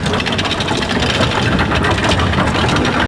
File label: close large door